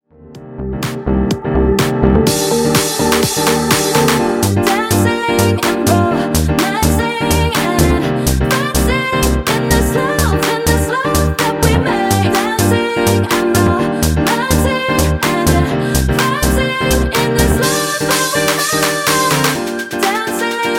Funky Edit 125 Bpm

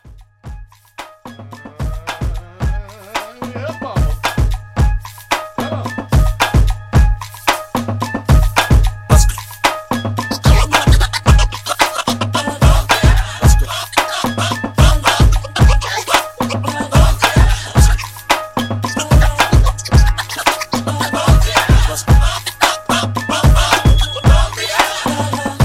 party break 111 clean

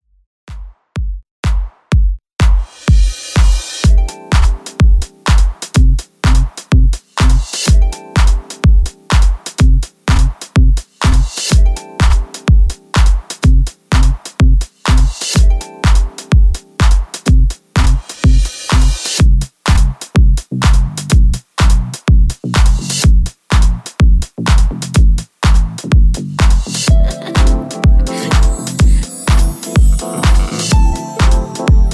Classic Mix 125 Bpm

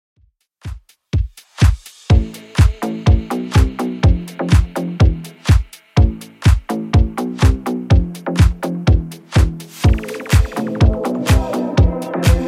House Redrum 124bpm

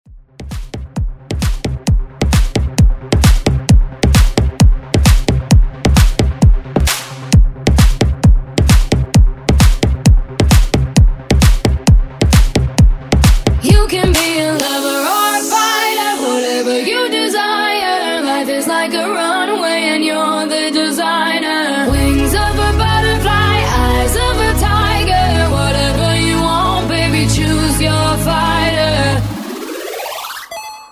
dance redrum intro

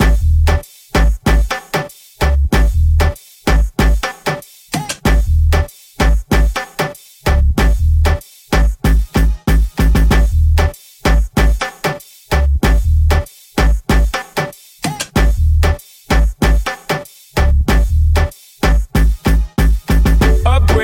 Moombahton Remix; Remixfreakz

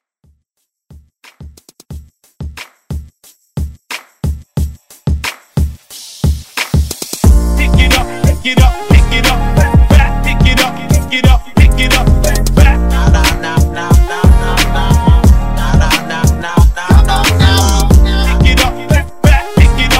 Hype; Redrum

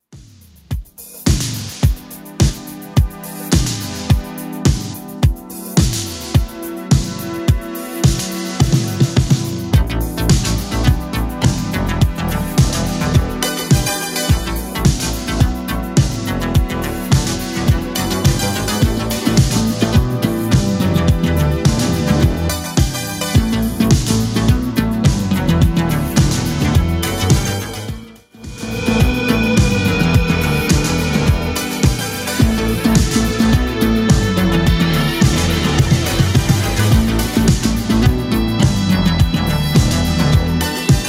80s Extend Redrum 106 bpm CLEAN

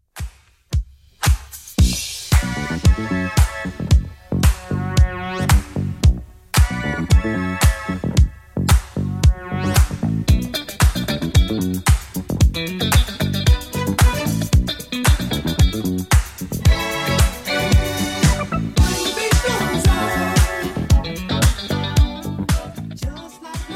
70s Redrum 113bpm

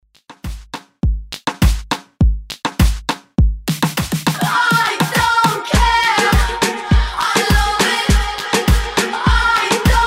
Top40 102bpm

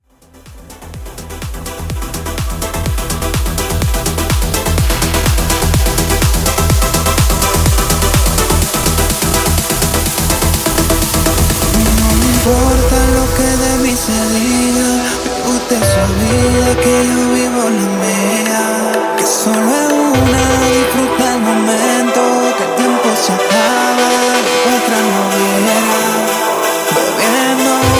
Epic Festival 125 Bpm

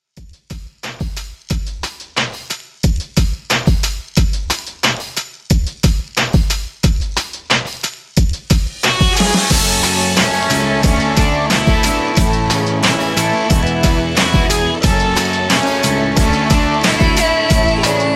90s Redrum 90Bpm

